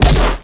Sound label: hit body, skater; Tony Hawk's Project 8; XBOX